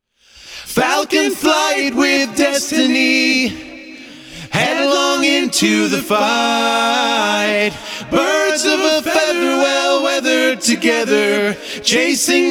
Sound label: Farm Boy Chorus Vox Solo